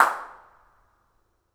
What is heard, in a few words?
incinerator dirac